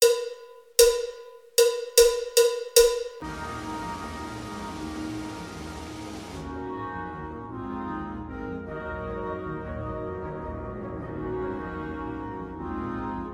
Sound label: Spanish Dance